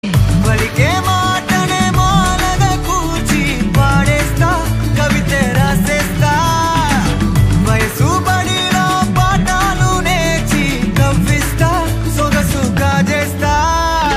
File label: Ringtone, Telugu; Varun Doctor